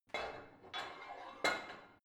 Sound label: barbell heavy plate put on; sport freizeit; fitnessstudio